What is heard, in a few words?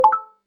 start new round